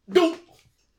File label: ouch; dolls House; bony